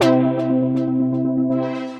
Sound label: Synth Lead